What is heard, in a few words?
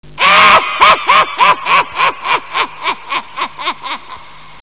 wehehe; valve